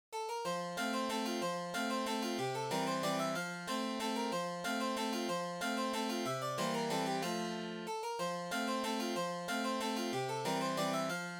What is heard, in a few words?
Snow Drop Waltz